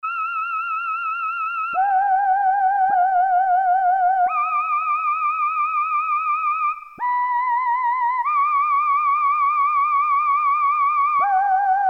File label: SOLO VOICE, Roland Jupiter-8; Factory patches